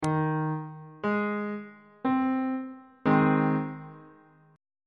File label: g sharp major 2nd inversion on bass clef